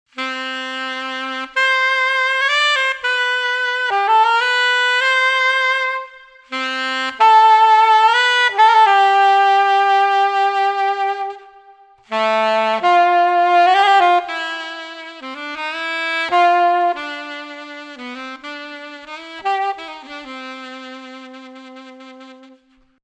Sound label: sax